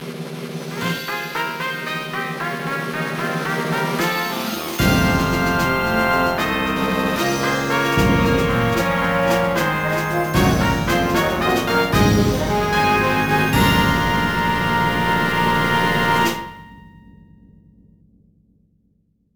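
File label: Event Fanfare; Kousoku Card Battle Card Hero; Nintendo DS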